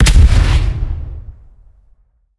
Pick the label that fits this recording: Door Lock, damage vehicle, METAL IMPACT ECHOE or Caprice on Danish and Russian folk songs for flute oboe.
damage vehicle